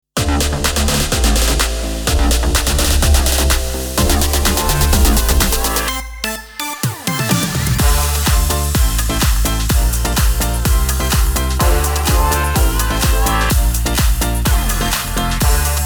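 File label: Sung Jingles Radio, 126bpm D minor, Ramp; Cinemas Radio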